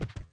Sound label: move; Fly For Insanity